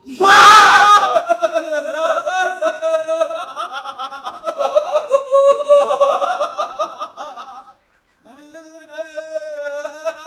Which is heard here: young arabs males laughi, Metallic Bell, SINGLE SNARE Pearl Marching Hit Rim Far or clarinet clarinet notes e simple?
young arabs males laughi